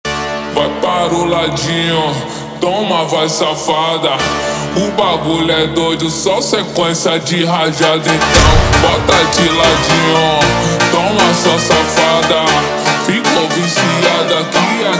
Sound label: Funk slowed